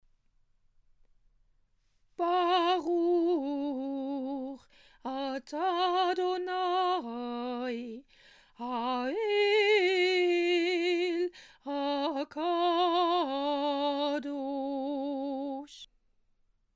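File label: amidah endings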